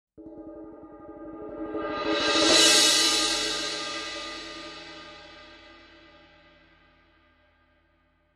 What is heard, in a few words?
K Constantinople Suspended, cymbal